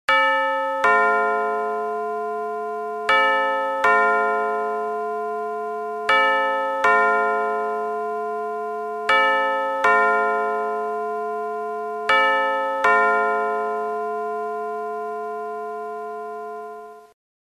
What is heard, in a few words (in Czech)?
BIM-BAM bateriove dvoutonove, hodiny; kyvadlove pendlovky; bateriove klasicke